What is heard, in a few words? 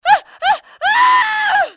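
scream, female; fmale; Soldier Of Fortune II Double Helix; XBOX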